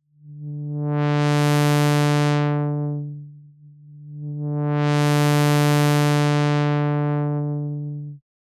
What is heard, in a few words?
Cut Off Gain